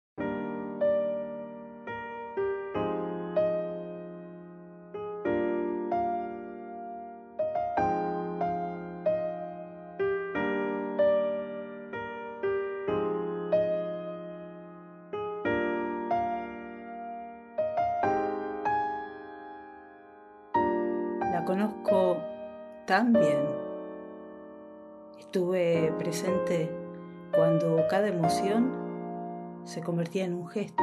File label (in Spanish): MAPAS con musica